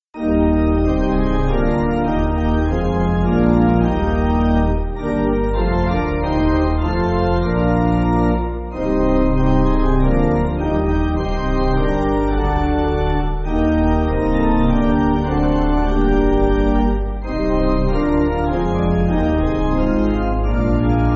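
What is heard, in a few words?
Pipe Organ, Backing Files for Churches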